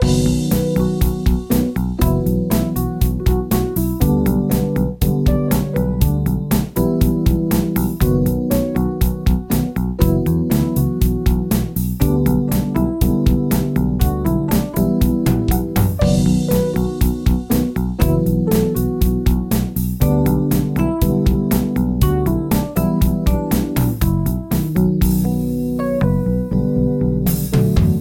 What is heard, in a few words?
unused music